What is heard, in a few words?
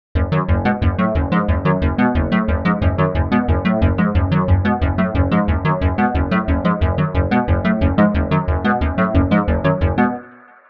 fm fast seq; the analog sound waves